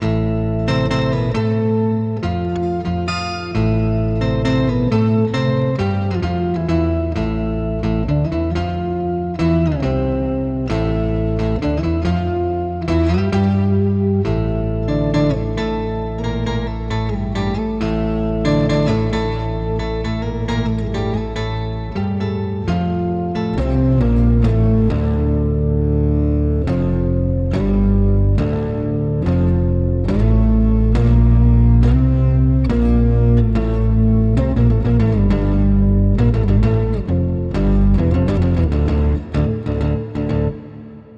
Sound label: Neural DSP Quad Cortex Effects Octaver